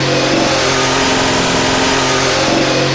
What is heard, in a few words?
in CMED, F1; Japan; AOS F1 2022